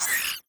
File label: spiderling death; enemy